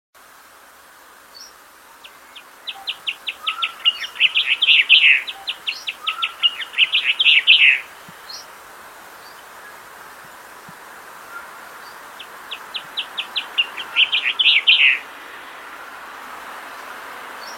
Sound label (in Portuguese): canto do tentilhao azul de tenerife